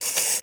Electric Timer Turn; Clocks, Watches and Timers; Blastwave

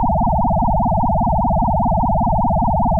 Sci-fi Hover Bot Loop; SFX Kit; Sci-Fi Loops

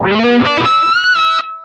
Pickup Guitar Lead Riff; Pickups

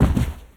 Bodyfall Carpet; SFX Kit; Bodyfalls

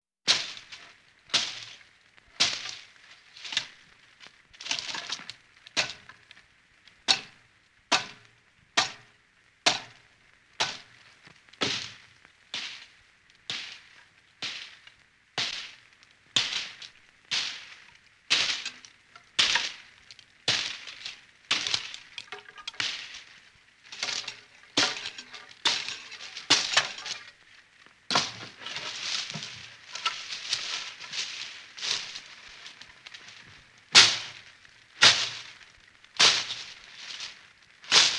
Chopping With Bolo Knife; horror sounds; Hollywood Edge

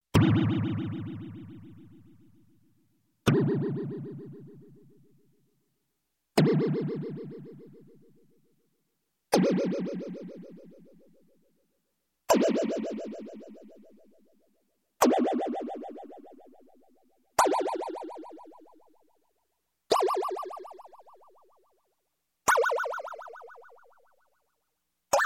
Space Space Boing, Many Different Boings; Electronic; Sound Ideas 2000 Series

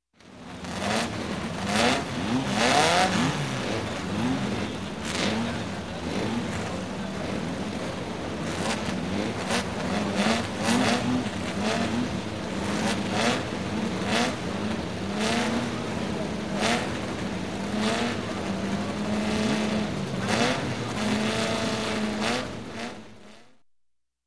Dune Buggies, Race Several Buggies Revving Up; Sound Ideas; Series 2000

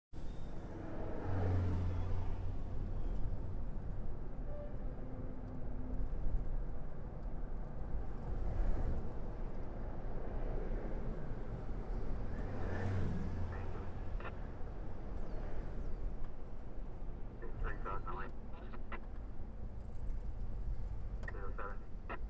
Distant road police Radio trafic; KMP Film Video Sound Effects

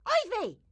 grandma short yell; Blind Drive

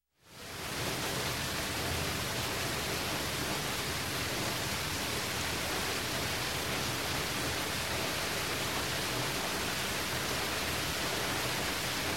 Rain, Heavy, Traffic; Sound Ideas 2000 Series

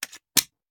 Hand Gun Foley; Sound Ideas; Guns

